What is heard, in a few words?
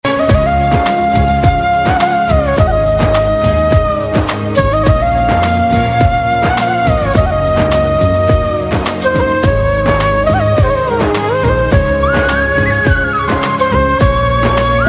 lovely flute; classical